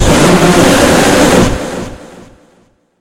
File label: hourglass screech